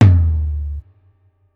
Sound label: Kit, breakcore Drum Hits